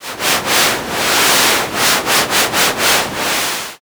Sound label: river